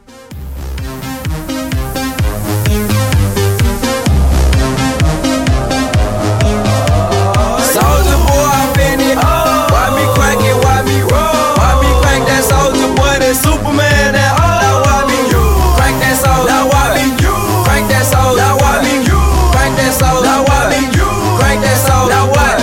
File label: Mashup 128